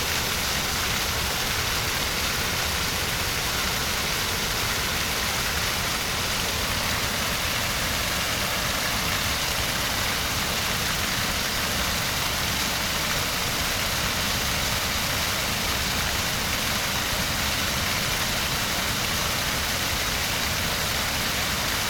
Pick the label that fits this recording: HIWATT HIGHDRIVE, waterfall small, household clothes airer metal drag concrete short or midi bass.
waterfall small